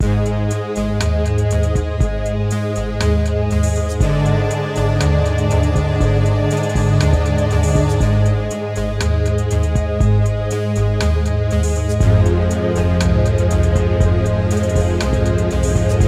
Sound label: Beach Relax Disco Electronic Electric Beat Chill Retro Synth Dance Cinematic Music